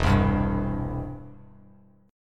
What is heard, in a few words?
E Minor Sharp 5th E down Bass Standard; Em#5 strummed; JGuitar